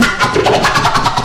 scratch

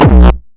Bombo Hardcore, kicks

